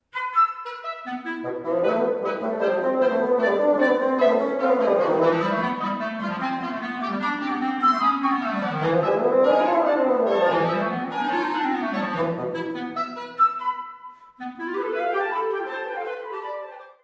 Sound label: Woodwind Quartet; Mvmt III